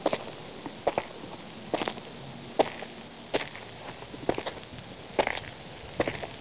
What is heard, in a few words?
walking; 10,000 Graphics Pack Volume 1; Media Graphics International